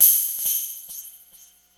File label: SPACE NOIS, Electro Perc; E-MU Formula 4000 Series Vol. 3; Analog Odyssey